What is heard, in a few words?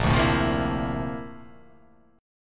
A sharp Major Sharp 11th